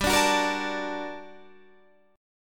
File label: G Minor Major 9th, guitar chord